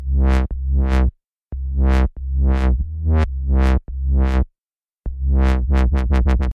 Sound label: Bass Test